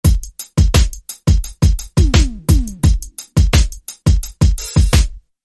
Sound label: Drum Loop